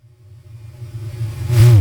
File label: SHORT, SHORTNOIS